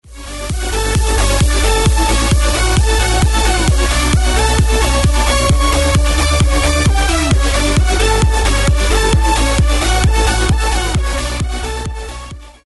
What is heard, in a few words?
funk rnb hits remixed; multitrax